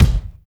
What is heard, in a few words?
NEEDLE KICK, HIP-HOP; Drums In Your FACE!